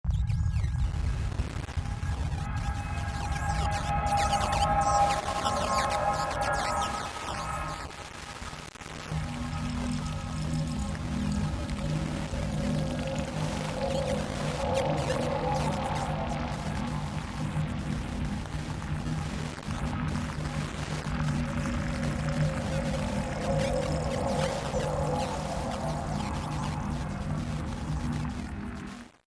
Alien Transmission Static Radio Signal Distortion Scifi; Communications